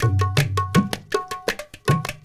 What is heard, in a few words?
INDIA R, Heart of Asia, IND ENS